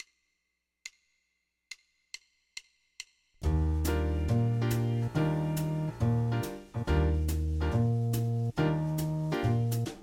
Ebmaj7 1 Chord Groove; guitar; guitar lessons

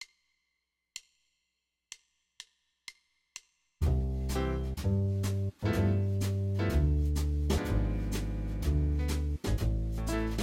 C G7 Gdim7 Am; guitar lessons

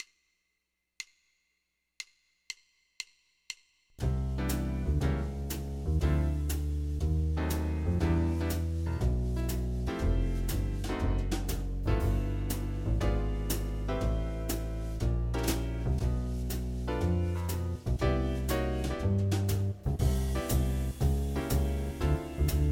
Walking up C scale with Dim7 chords; guitar

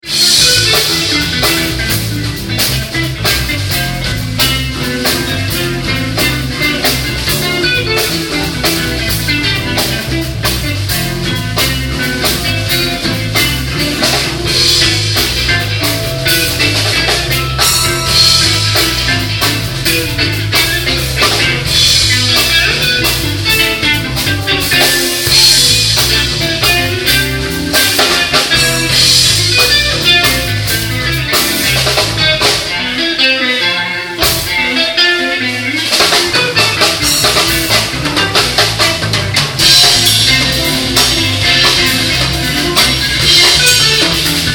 BachRock live

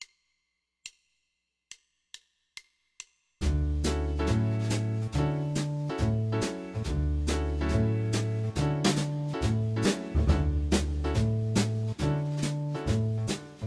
Dmaj7 1 Chord Groove; guitar; guitar lessons

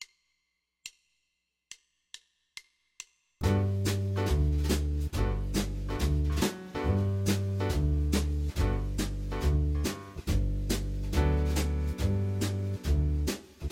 Gmaj7 1 Chord Groove; guitar; guitar lessons

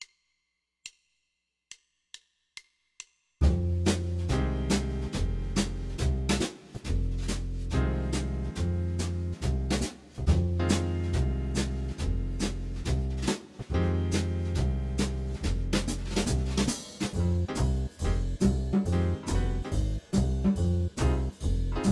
Fm7 1 Chord Groove; guitar

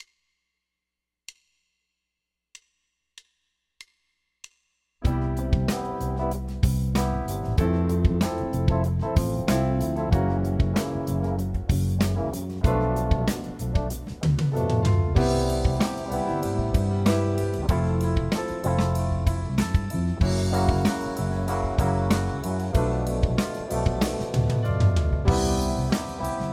Cmaj7 Fmaj7 F7 Bm7b5; guitar lessons

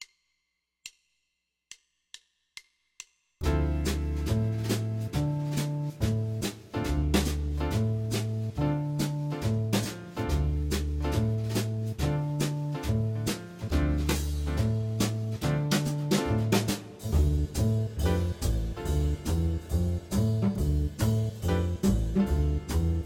Dm7 1 Chord Groove; guitar; guitar lessons

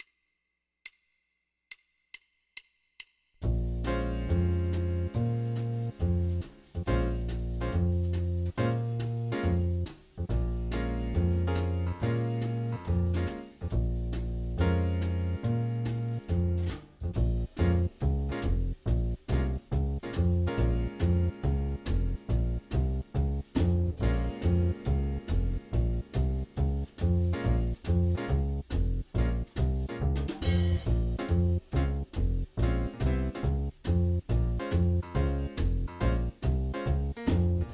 Bbm7 1 Chord Groove; guitar; guitar lessons

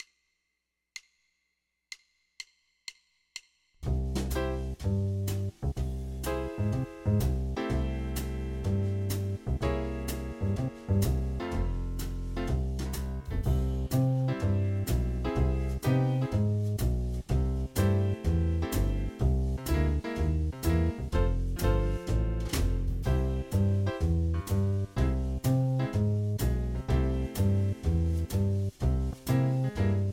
C Caug C6 C7 F, guitar; guitar lessons

